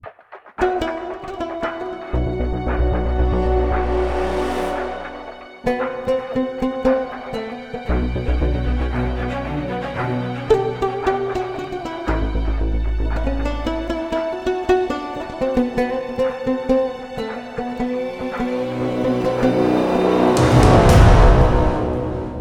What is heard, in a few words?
Intro Signal